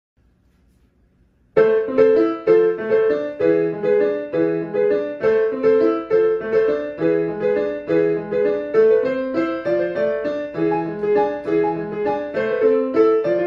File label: Joropo punteando piano solo